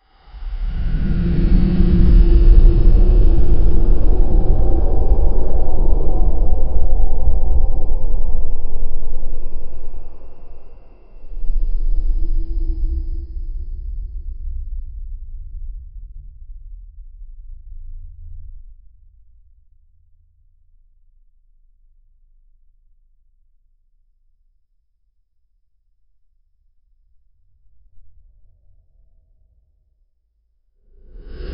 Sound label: air tightens with an eeri, kaiju